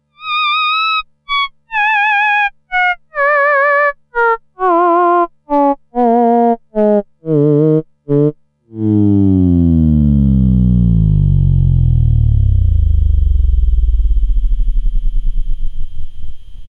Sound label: theremin